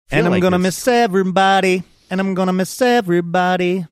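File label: singing and i'm gonna miss ev'rybody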